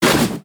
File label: melee pre attack; terrorblade